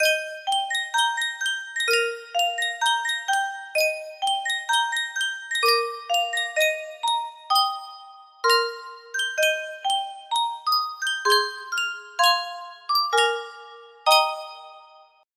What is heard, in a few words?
yunsheng music box, in F major